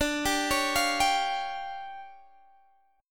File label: D Minor Major 7th Double Flat 5th D Guitar Open D